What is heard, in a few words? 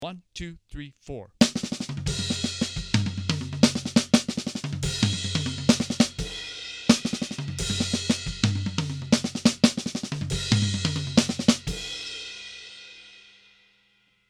Melody Kit